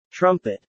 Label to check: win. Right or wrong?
Wrong